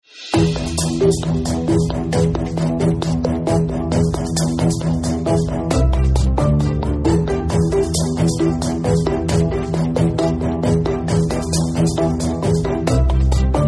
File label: music round